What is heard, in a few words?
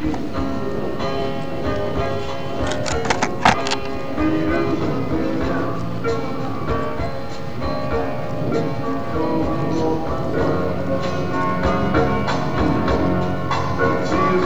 Soundcheck